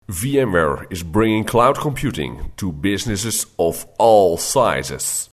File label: Ringtone; VMware